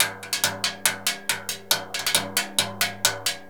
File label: CASCARA, Synclavier Percussion